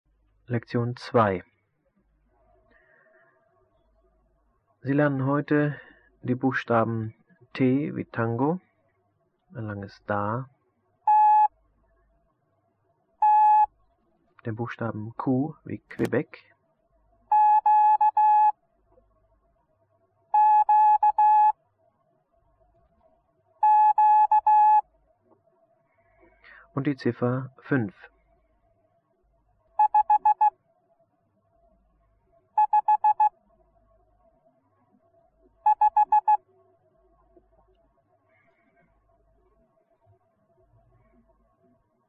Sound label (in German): Morsen